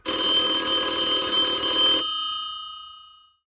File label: Phone Ring; Phasmophobia